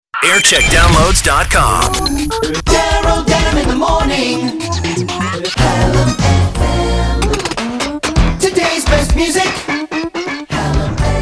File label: jingle montage; hallam